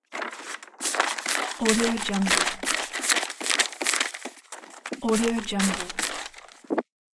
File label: fast book page turning and close book